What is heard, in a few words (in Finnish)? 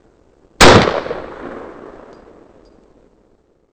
laukaus